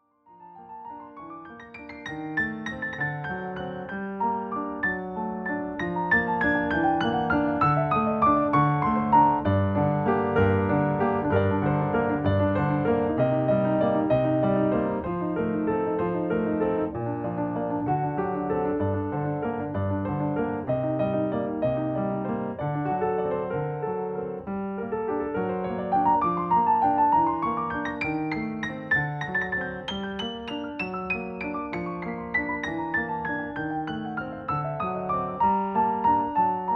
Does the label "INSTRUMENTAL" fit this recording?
Yes